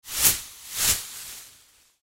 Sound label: Pom-pons Shake, Pom-Pons Shake Double; Sports; Foley; Miscellaneous Sports; Blastwave